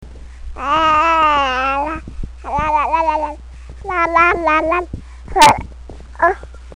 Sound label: aah burp, Sounds straight from the mouth